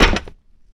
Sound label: land metal solid, footsteps